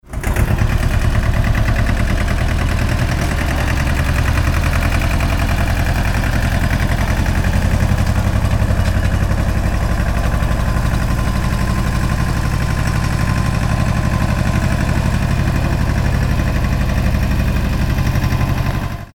Marktplatz Traktor Vorbeifahrt Atmo

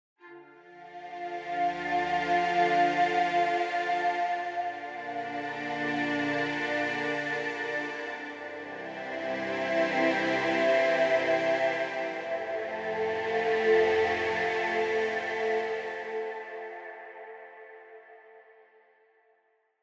Analog Strings Selbst erstelltes Preset; Output

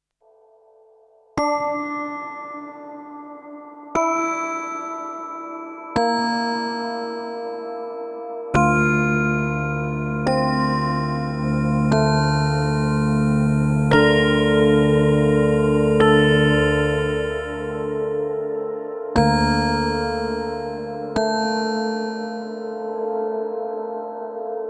Strymon Big Sky langer Hall Digi Synth

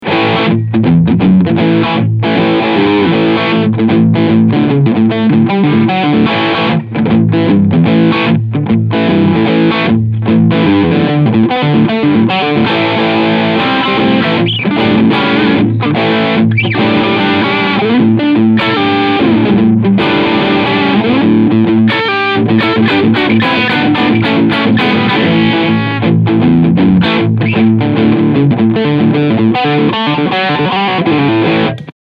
DV Mark DV Little FG 250 Gitarrenverstärker Crunchsound

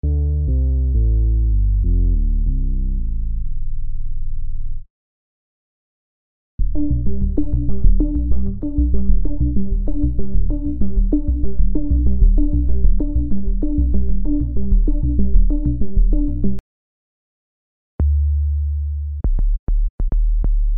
SUBBOOMBASS Beispiele

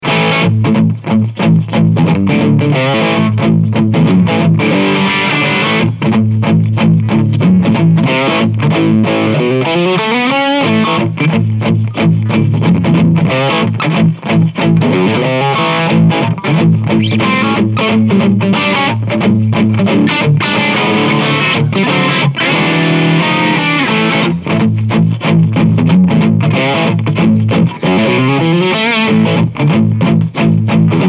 Crunchsound EQ 12 Uhr

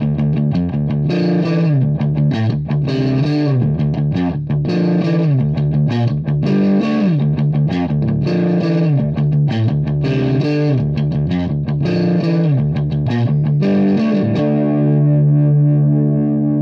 Verzerrer Pedale Mid Gain

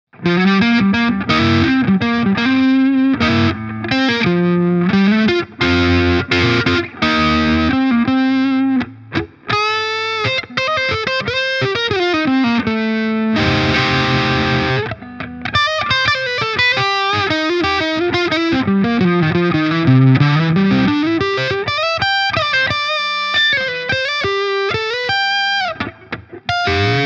Hagstrom Alvar verzerrt Hals

